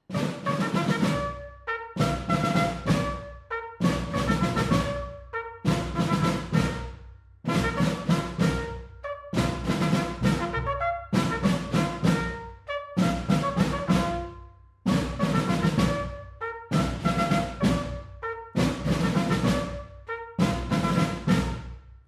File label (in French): Sonnerie « Au Drapeau »; COULON 79